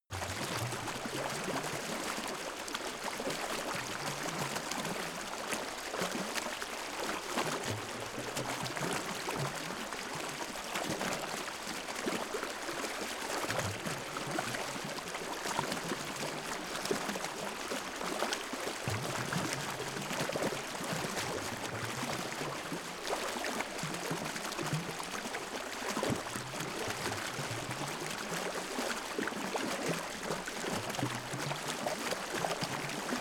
stream bubble irregular

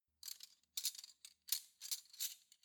cutlery spoons handle soft

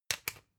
usb port metallic in hard

